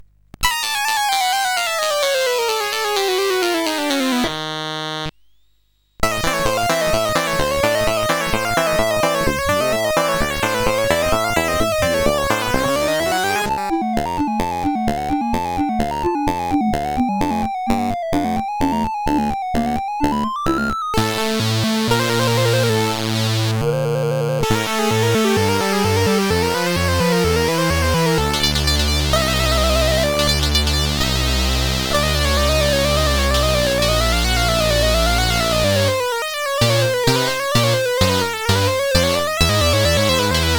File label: Buzzzzz sid CSG8580R5; Tonal Teapot